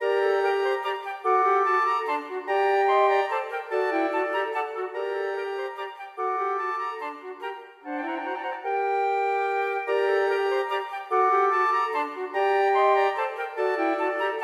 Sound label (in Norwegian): Menuet for fire fløyter; Aamodt